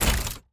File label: ui building repair